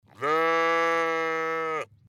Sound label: stones sheep